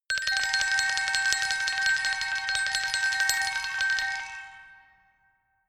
glockenspiel mysterious tremolo high

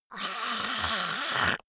monster choking zombie idle high; koerpergeraeusche; comedy-cartoon; soundpool

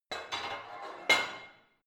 barbell heavy plate put on hard, fitnessstudio

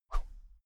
whoosh thin slow hard; swooshes-swishes

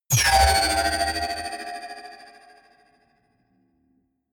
sci-fi magnetic sling big hard; energie

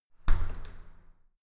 entrance door close soft

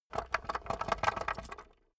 small metal rattle on wood; industrie wirtschaft; metall

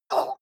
water monster female gargle groan pain short; koerpergeraeusche; comedy-cartoon; soundpool

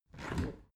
window open heavy; objekte; fenster

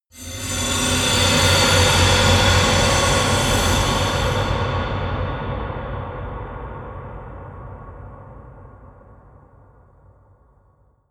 eerie industrial swell; sweeps-swells

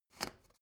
step ladder wood scrape single

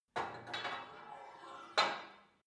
put weight onto barbell, fitnessstudio

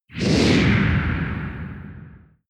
whoosh swoosh hard large; swooshes-swishes

